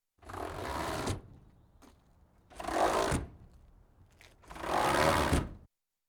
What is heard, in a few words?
Fire Engine, Sliding Side Panels; Emergency; BBC Sound Effects Library